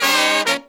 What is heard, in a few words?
132, Pop & Funk Brass